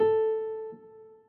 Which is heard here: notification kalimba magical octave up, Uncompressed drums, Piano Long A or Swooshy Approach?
Piano Long A